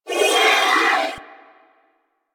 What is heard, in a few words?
Sentient Symbilyst Pain; Enemies; Barks